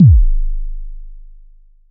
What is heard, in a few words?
kick